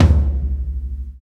Huge Tom Drum Single Hit C# Key, Royality free tom drum one shot tuned to the C# note